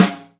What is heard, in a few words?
Snare Soft